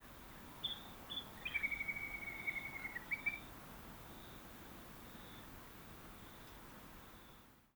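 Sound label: pik pik trill nocturnal flight call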